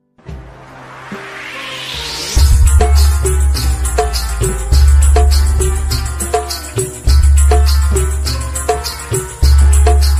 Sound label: maa durga ringtone, navratri